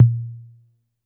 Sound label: A2 Analog Tom, Yamaha PSR-E323; Analog Kit